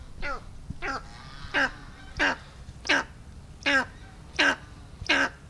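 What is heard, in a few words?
steppe eagle voice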